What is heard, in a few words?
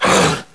pain